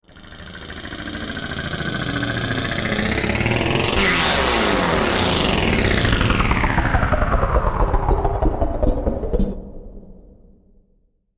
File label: Hostile Environment Pulse